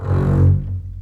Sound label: CBS F#1 MARCATO, String Collection; East West - The Ultimate String Collection